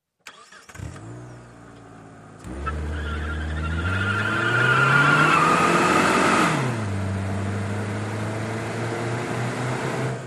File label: Sedan Mid ST Peel Out